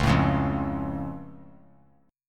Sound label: Db Augmented, down; strummed; JGuitar